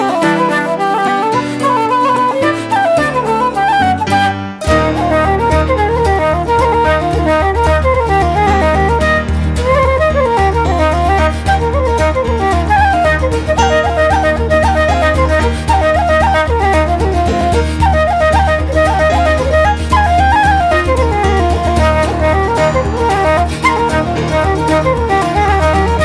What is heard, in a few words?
Hornpipe, bodhran